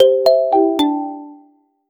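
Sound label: sound for notification, kids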